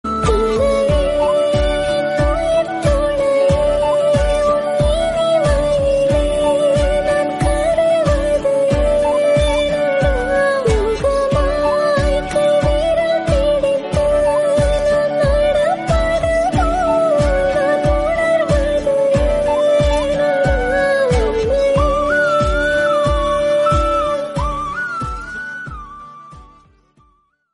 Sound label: Tamil Love Song Female Version; ringtone